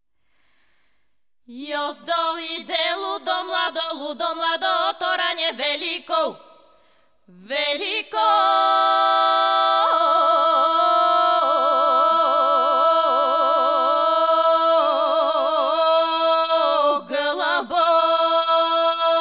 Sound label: Western Bulgarian refrain, Harmonies